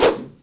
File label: Sword